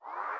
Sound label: slider up; Inception The App